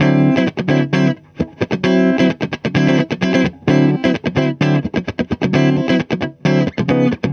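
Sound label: guitar, Roots Disco; USB Soundscan vol.32; AKAI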